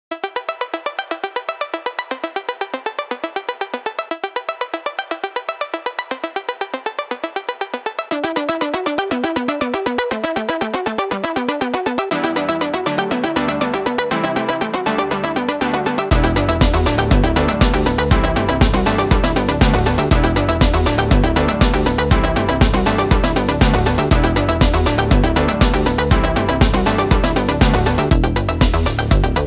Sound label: Synth Blast